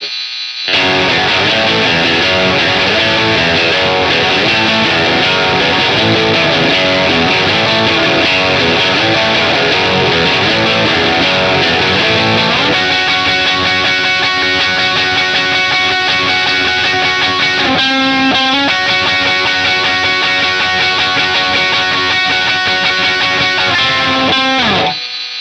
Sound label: guitar